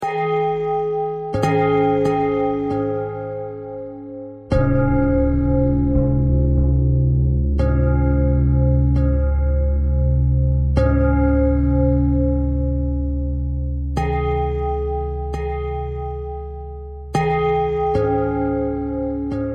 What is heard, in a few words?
bell bell thread simple, bells